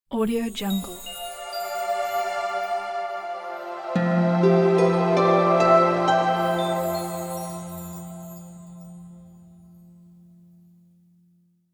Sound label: fairy tale intro stinger